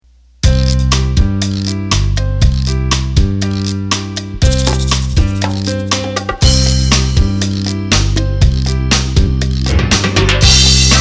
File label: Cha-Cha